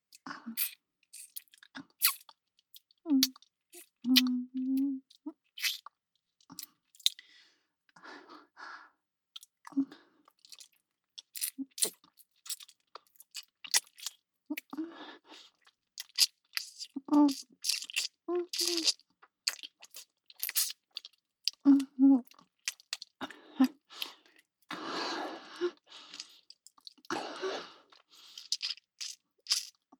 キス

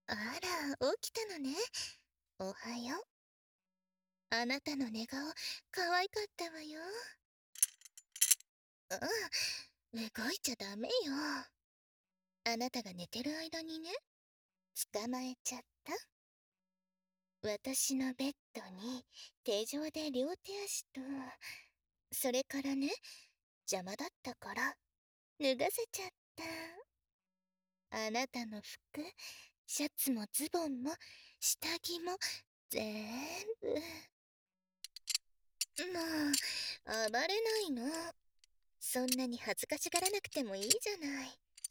拘束調教耳舐め

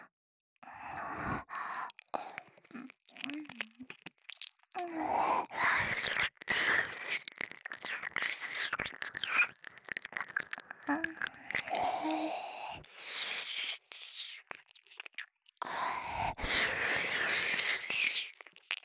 左耳舐め, ASMR; アドリブ集

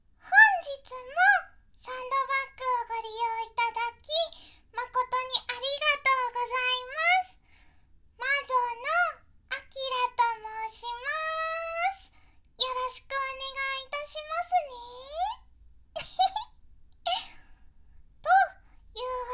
ゲップ祭り; 実演; ハイレゾASMR

